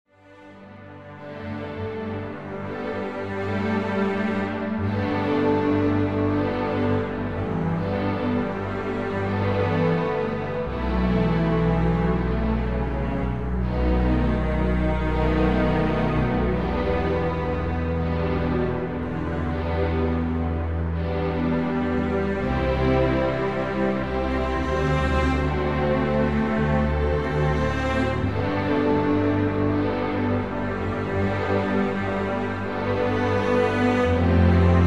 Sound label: Sitar